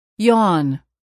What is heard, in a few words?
yawn